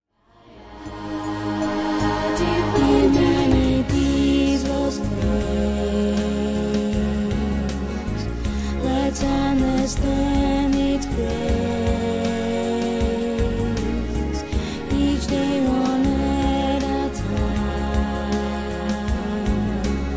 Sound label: A different beat